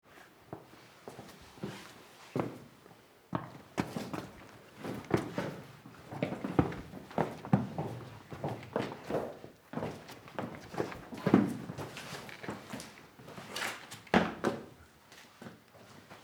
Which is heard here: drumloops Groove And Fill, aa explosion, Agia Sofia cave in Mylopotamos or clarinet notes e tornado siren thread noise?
Agia Sofia cave in Mylopotamos